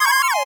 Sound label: 8bit se